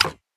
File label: dragon moves the wheel click; koi princess